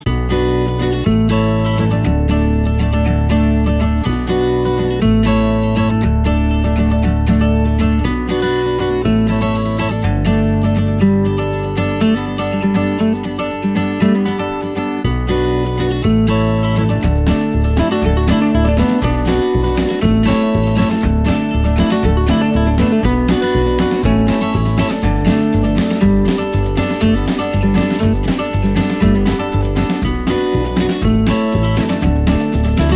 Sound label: Upbeat Acoustic Happiness